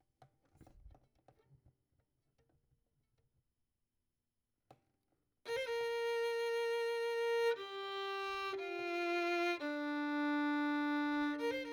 Violin; Verdigris Music

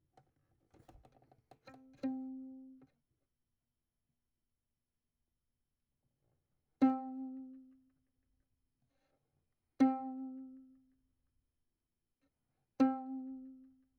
Violin; Verdigris Music

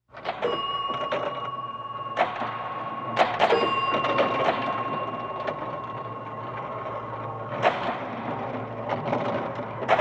Shooting Game; Midway

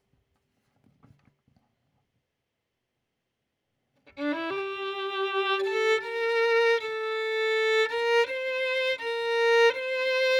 Violin

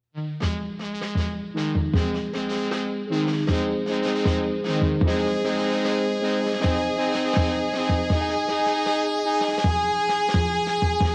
Marshal Fanfare

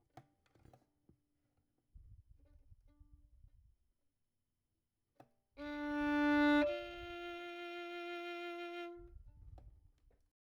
Violin; Verdigris Music

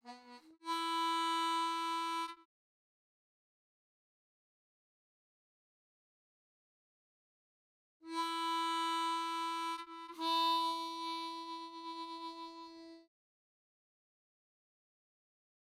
Harp Excerpt

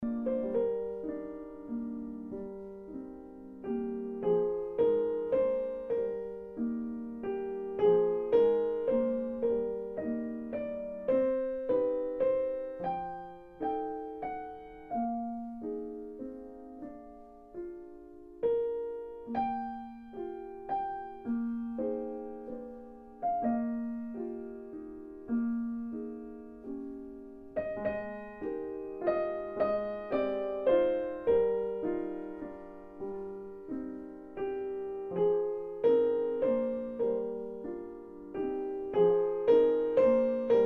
Instrumental Version

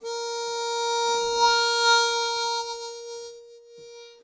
harp F Bb

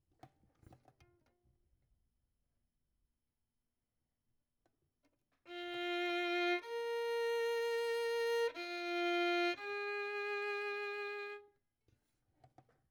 Violin; Verdigris Music

